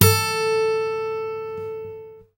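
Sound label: GUI Ac. A2, acoustic guitar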